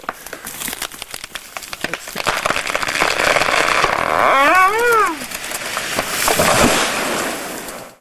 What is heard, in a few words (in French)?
Arbre qui tombe